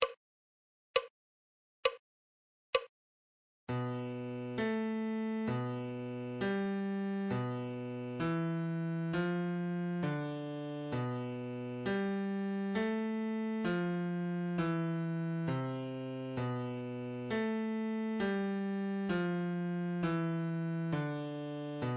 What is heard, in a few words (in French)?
Clé de Fa Adagio